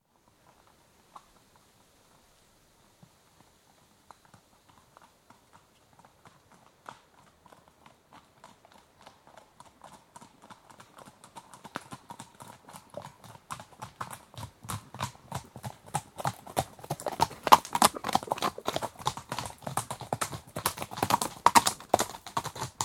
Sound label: Two horses trot on approach, one pauses briefly then canters to catch up, left to right; Horse Sound Effects; BBC